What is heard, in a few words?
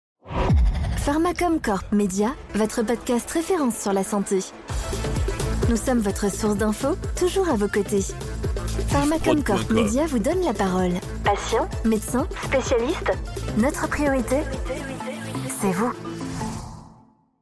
Jingle Podcast; Pharmakomkorp